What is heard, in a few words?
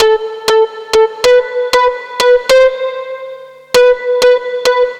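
synth, Roots Funk; USB Soundscan vol.41; AKAI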